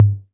Tom 808; Roland SPD-S

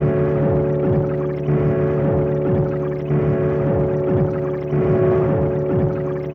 Crude Tape Loop, Tape delay; radiosonics

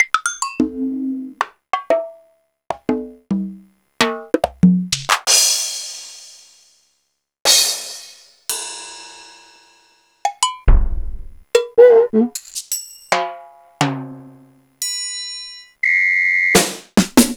Roland W-30

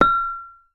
BURMESE G, BURMESE GONG; Voice Crystal - Steve Reid Definitive Percussion

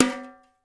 BRUSH TIMB; Spectrasonics; Liquid Grooves 1 & 2; BR.TIMBALES

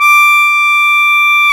KEY Cajun, Cajun Acord; Club-50 - Foundations Roland; Accordians

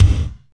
KICK, Tekno Industrial; East West

